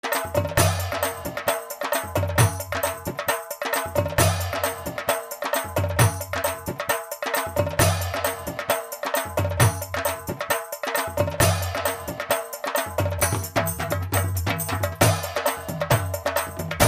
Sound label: Ringtone